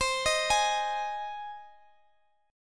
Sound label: C Minor Sharp 5th, Guitar, Open G; down; chord shape